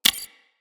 Console Hack Button Press

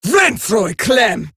attack, female

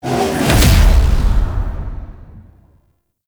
Platform Create; Warframe; Ambience; Cephalon Weave

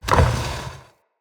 Warrior Statue Bust Place

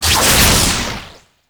Medusa Puzzle Orb Spawn; Warframe; Duviri

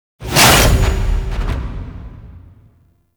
Sealab Stalker Melee; Warframe; The New War

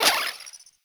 Pick Up Health; Warframe; Caliber Chicks Minigame; Pick Ups

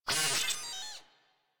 Mutalist Pain, Vocals; Warframe; Proto Infested

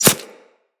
Arrow Impact Flesh; Weapons; Bullet Impacts

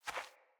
Beaver Dig; Foley

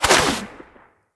Bow Shot; Weapons; Player; DendritBow

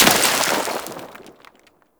Trench Small Dirt Impact; Gameplay; Warframe; Tau

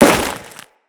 Crewman Step Ice, Footsteps; Corpus

